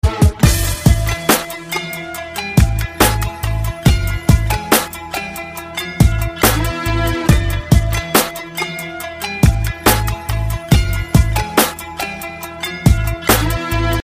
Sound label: top arabic ringtone